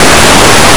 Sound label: paper; Blue Heat